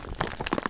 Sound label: CRUMBLE; mahlendur